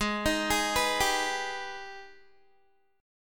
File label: G sharp Minor Major 7th G sharp down Guitar; G#mM7 arpeggiated